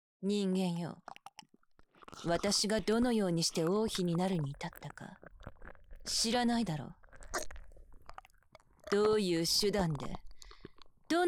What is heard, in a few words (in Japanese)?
耳舐めされ手コキにベロチュー、バイズリジュポジュポフェラのあと正常位で中出しさせられる音声; 26分間元王妃の生意気なエルフ